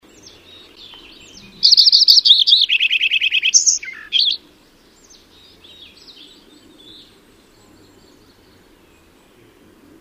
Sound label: Lincoln's Sparrow; Sparrows